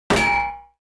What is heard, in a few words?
metal, metal hit